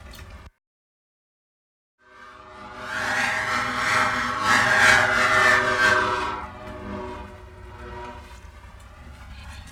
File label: Beast Amb